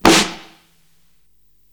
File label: Snare, Psychedelic Drums